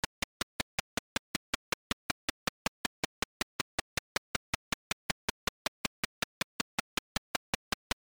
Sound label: Tape Start, vhs; ultimate vhs pack; Videohive